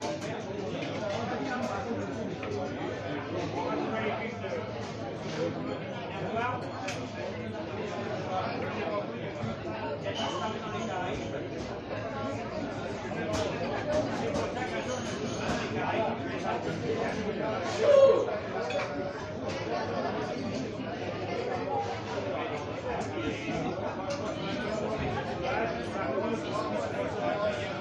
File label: blues